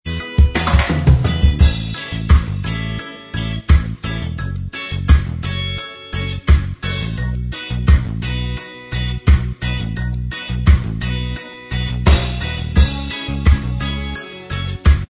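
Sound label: reggae style Instrumental Track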